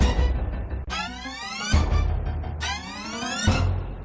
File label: lock ball in idol, jingle; WILLIAMS; INDIANA JONES; Indiana-Jones-Original